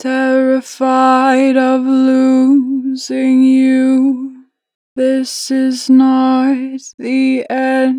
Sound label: Losing - 4 bar vibrato, Vocals, Natural Vibrato; Harmor Vocals